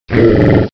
wolf idle; slender; marauder

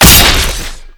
hit; slender; marauder

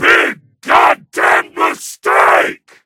stabbed; tf2; freak fortress 2

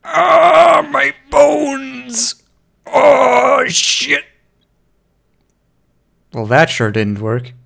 death; tf2; freak fortress 2

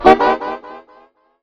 backstab; tf2; freak fortress 2; new spookmaster

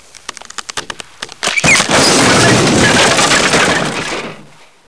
wall break; svencoop; blackops

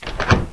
latch unlocked; buttons; svencoop; AoMDC

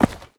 step; slender; chaosinsugunner

